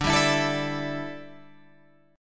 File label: D Suspended 2nd Suspended 4th, Guitar, Open G